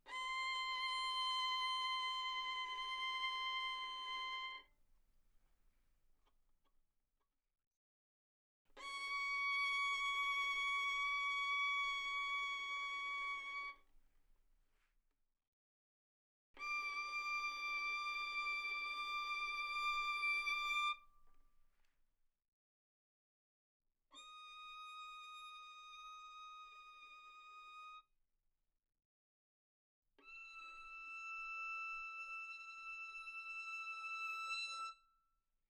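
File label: Violin arco mezzo forte sul A C6 Bb6; Strings; violin2012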